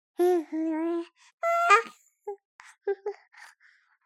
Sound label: BABIES DREAM Boy 3 months High Short; Baby Boom